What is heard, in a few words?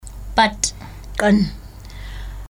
Pad